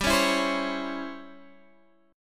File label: C sharp Minor Major 7th Flat 5th G, chord